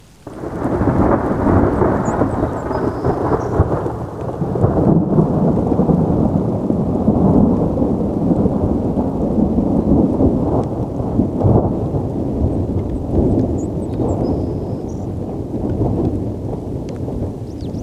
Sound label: Crisp Close Thunder; alaska acoustic highlights; GEOPHONY